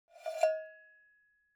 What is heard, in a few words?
object cowbell scratch hit soft